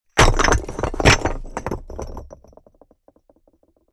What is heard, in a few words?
Wall of small rock bricks fall